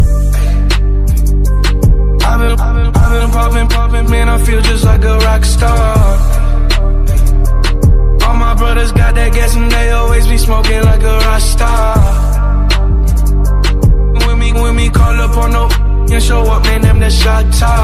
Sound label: emotional english ringtone